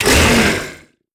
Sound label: Boss Hit Light; netent